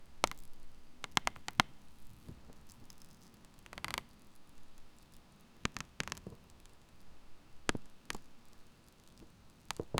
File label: faint wood creak from; log